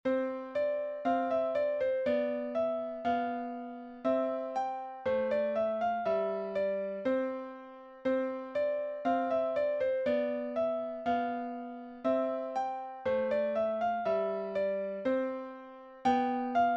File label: Allegro in C Major